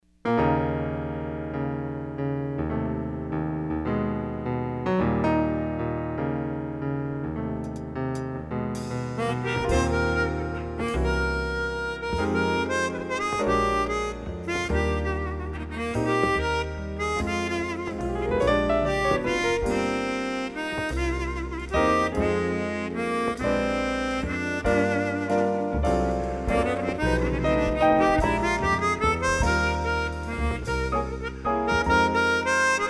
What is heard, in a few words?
Christmas Waltz